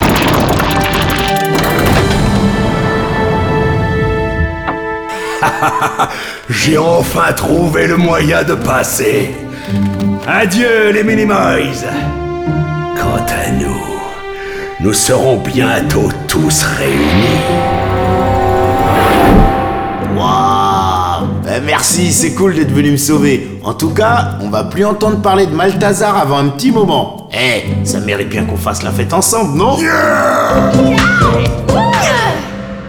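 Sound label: Outro Victory French; arthur and the revenge of maltazard; wii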